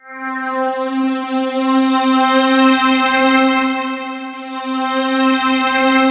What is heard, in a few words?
C3, SynthLine